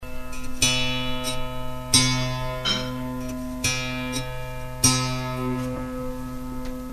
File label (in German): prime, musik